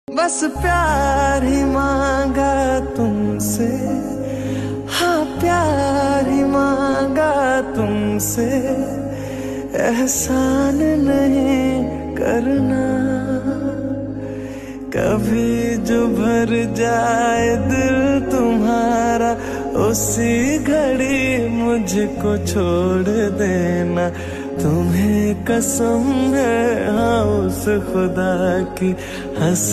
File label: Ringtone